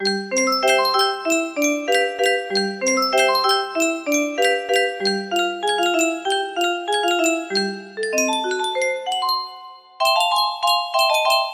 music box

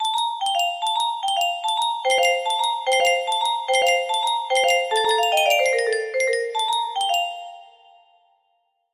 music box

